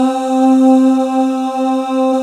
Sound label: Male Ooz Az; 90s Sample CDs; Club-50 - Foundations Roland